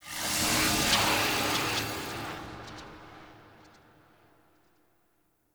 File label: Puzzle Mural Hint; Puzzles; Circade